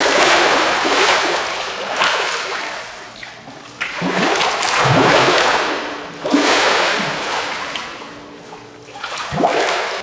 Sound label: continuous water splashing in a pool